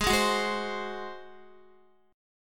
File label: G sharp 7th G sharp, Guitar; G#7; x,11,8,11,8,8; strummed